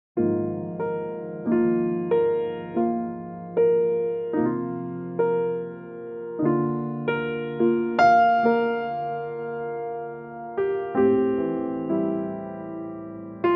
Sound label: F piano